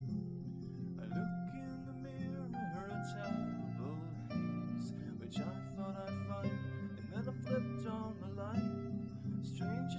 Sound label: nodrums liverec 5cm; SASSEC07